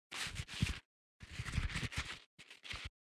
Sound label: grabbing